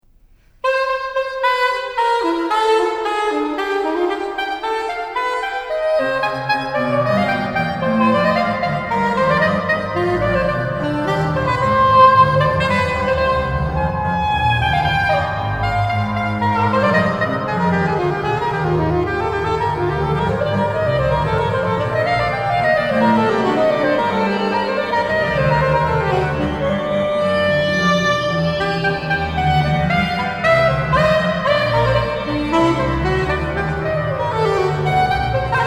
Allegro Oboe Sonata in c; Oboe Sonata in C minor, HWV 366

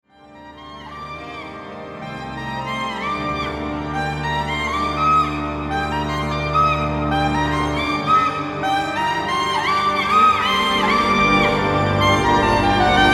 Introduzione Praeludium in g; buxtehude

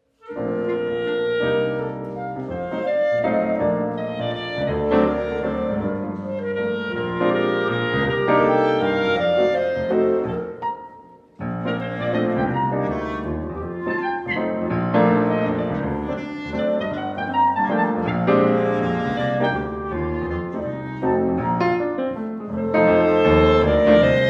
Menuetto in Jazz

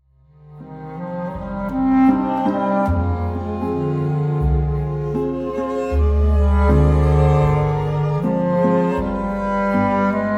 Lullaby

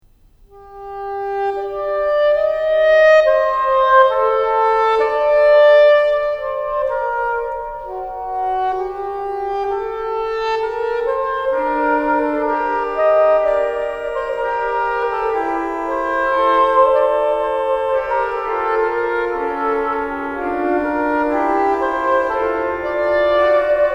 Fuga Praeludium in g; Präludium in g, BuxWV 149

